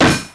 monster metal

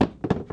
goblin king die

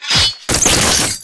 chain reaction shot

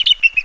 icewind die

